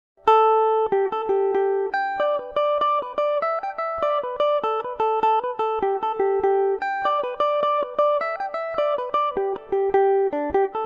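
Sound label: G major revelation, irish mandolin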